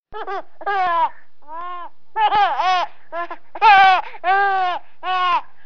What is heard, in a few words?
Baby laughing